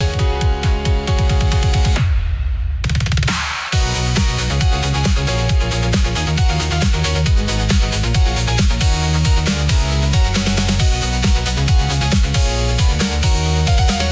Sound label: loop